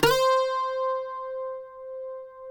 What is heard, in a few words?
CUATRO C 5, Guitars; Spectrasonics; Hans Zimmer Guitars VOL-2